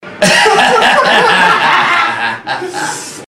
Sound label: laugher, epic manically laughter